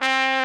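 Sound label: brass bright trumpet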